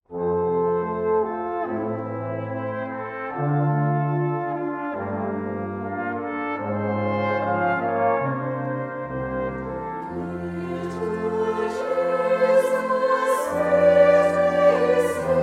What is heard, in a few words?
rocking brass